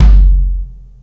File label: Kick, Kick One-Shots; KJ Sawka - Drum n Bass & Beyond; Drum Tool Box